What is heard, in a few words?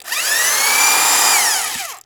Electric Drill Tighten; Tools